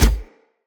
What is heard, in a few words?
Cannon Tesla Shot Punch; Weapons; Player